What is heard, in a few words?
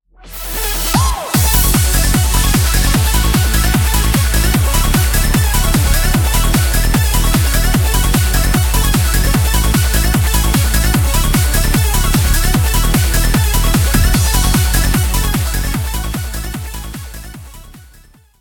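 background music crossfade; Invaxion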